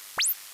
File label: AN/TRQ-35 IONOSONDE